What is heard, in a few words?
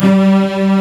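Kurzweil C4, strings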